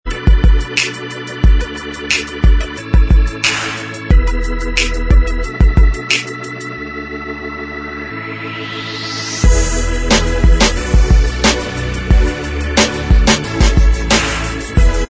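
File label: Instrumental Track, backing tracks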